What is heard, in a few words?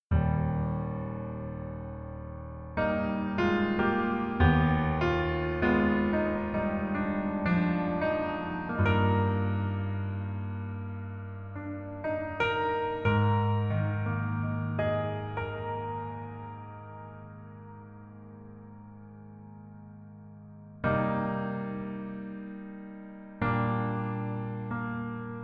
Piano ver